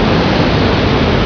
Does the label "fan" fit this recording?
Yes